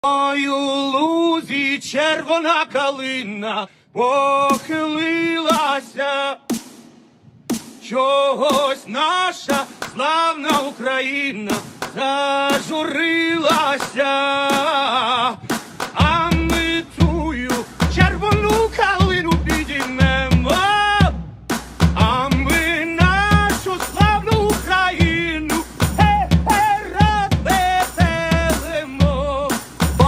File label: cervona kalina ukrainian folk song army remix